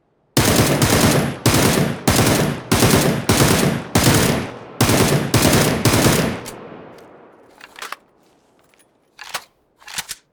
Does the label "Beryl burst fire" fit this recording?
Yes